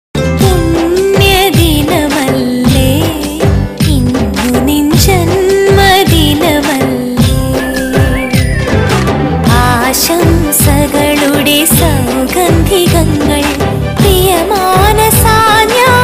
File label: birthday malayalam birthday song